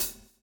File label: SWING